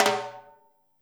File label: FILL, Percussion